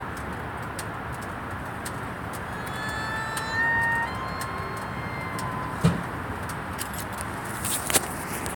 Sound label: squealling sound coming front